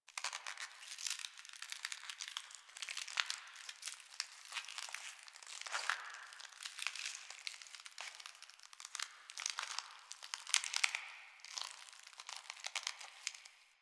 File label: Exterior Critter Loop; Warframe; Infested Eris; Ambience